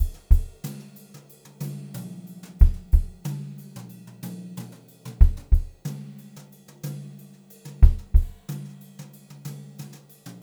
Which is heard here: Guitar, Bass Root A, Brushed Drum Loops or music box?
Brushed Drum Loops